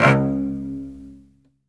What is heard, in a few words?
SOLO CE, Strings; ILIO